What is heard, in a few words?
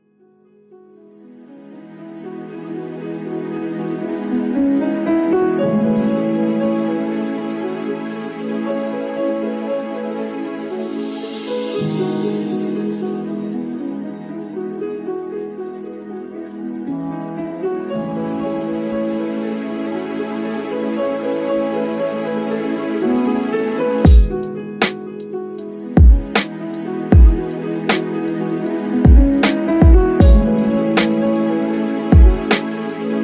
chill